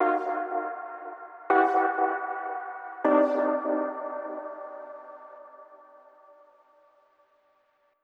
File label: MELODY, LOOPS; DUBPULSE